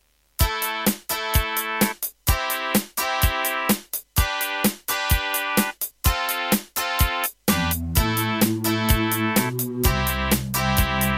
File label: Terratec MiniWaveSystem SOWT-11; raptor call of the shadows